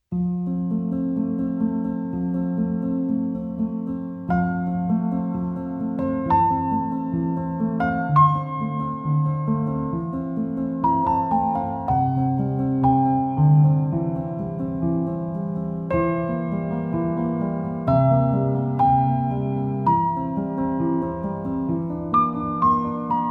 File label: Piano Concerto